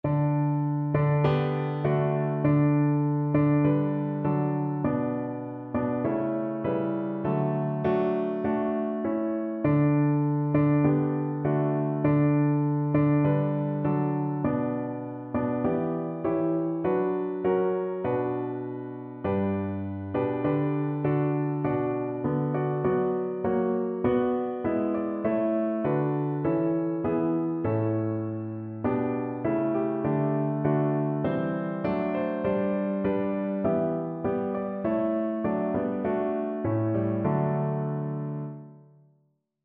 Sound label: piano